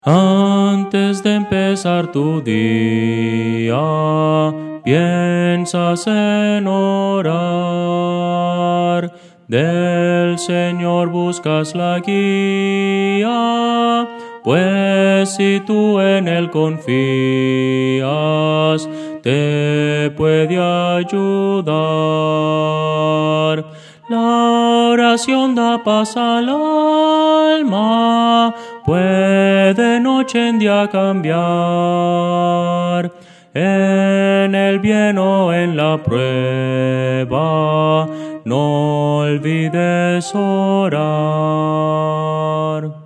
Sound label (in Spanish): soprano